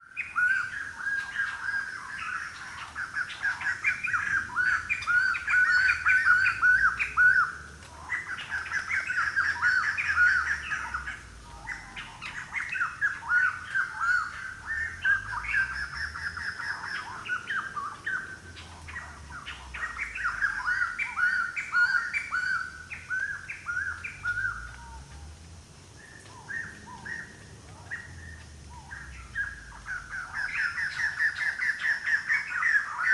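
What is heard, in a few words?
Thailand Korat Khao Yai National Park afternoon jungle with many birds dropping from trees; Sonniss GDC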